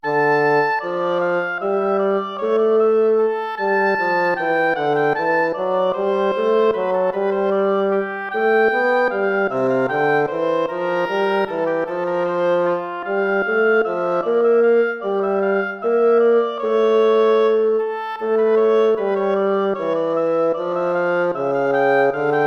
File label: air, hornpipe